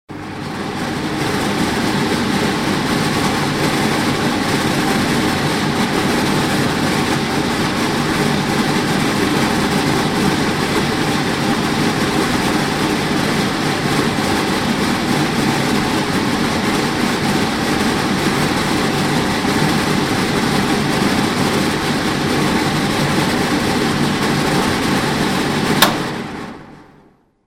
shum vytjazhki

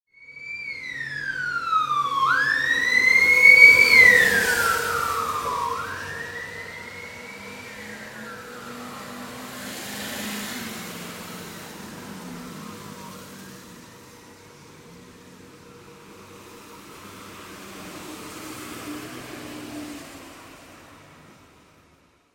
zvuki pozharnoj mashiny

